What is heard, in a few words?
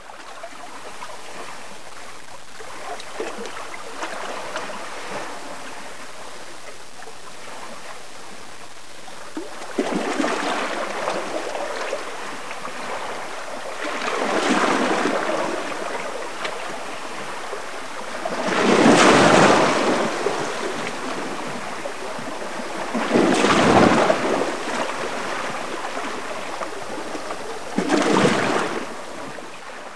pirate boat dock, ambient; valve